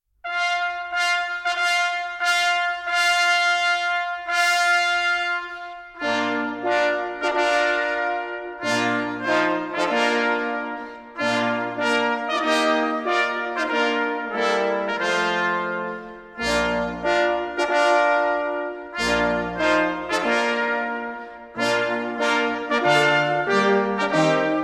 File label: bridal chorus, brass band; wagner